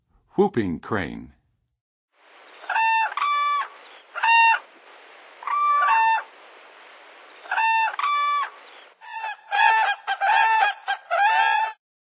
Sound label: Whooping Crane; North America; Western USA; Western USA Songbirds